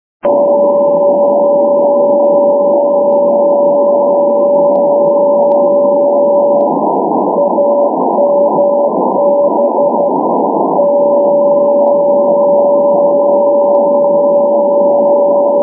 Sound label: ndb amboise; paris; europe